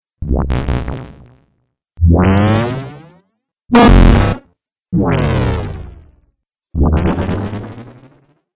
Dubstep Audio Laser Collection, sci fi

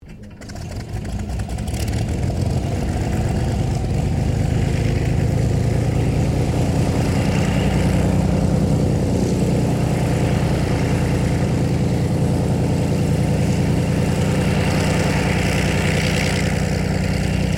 AIRCRAFT P-40 KITTYHAWK STARTUP IDLE; P-40 Kittyhawk / Warhawk / Tomahawk; Airplanes

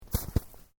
Footsteps Boots Snow Step; Footstep Foley; Footsteps (Sound Effects:Human)

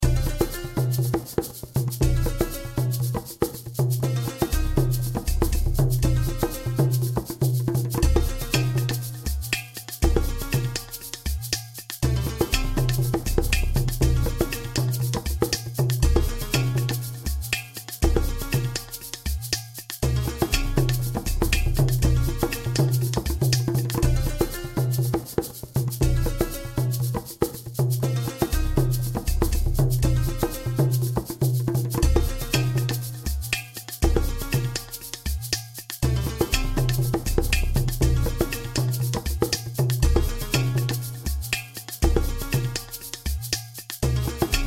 Tribal Eastern, Tribal Eastern Rhythm Melody Loop; Musical Sound Design; Blastwave

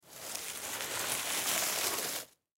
Rope Drag, Foley Rope Drag Dirt Pull; Rodeo Sound Effects

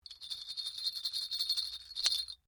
SLEIGHBELLS LONG, Cheap percussion toys; Musical

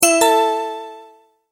multimedia tone verified

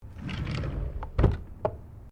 Drawer Interior Wooden Utility Small Open; Various domestic drawers; Household; SoundEffects Foundation2

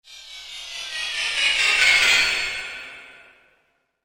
Sharp Metallic Descent; Andrew Fleming

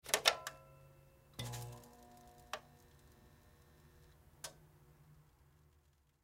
Pc Monitor Turn On Switch Power Crt Screen; Computer; Technology; Computers; Blastwave

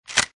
Machine Gun Foley Insert Magazine; Machine gun, type 1; Gun Handling; Lynne Music

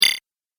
Game Power Button, video game; Twistedtracks

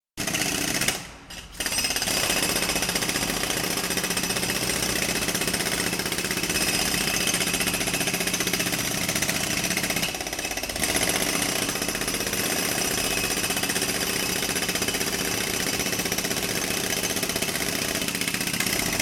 industrial tools jackhammers operating; 2x Jackhammers ( Pneumatic Drills ) Operating; Power Tools

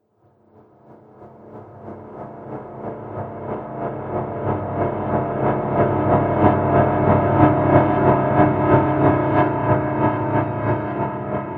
Horror Piano Tremble, Piano Sound Effects

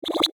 Electro Scan; Sci Fi Music; electronic sound effect; Andrew Fleming

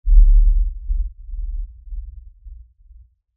Impact Lfe Rumble; Low Impacts (Sound Effects:Impacts); Blastwave

